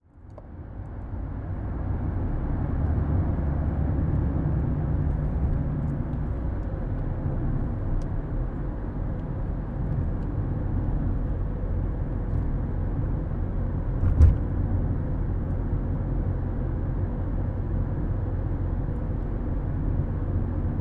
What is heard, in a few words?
Night Cruise through Salzburg Stereo